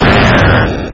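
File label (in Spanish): "Grito de Arbok", Pokémon; Actualizado a la sexta generación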